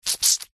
horror gore fresh fleshy guts bloody squeeze gouging squelch